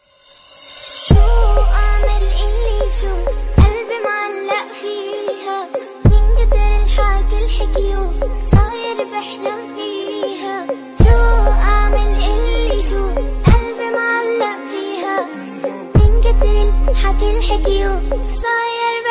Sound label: Arabic Dj Remix Ringtone; ringtones